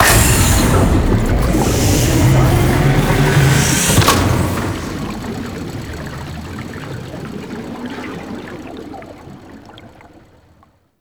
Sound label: Water Machine Activate Antitoxin; Props; Warframe; Grineer Forest